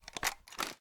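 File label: weapon foley assault rifle reload grenade launcher end; weapons; gmod; viper; scharlie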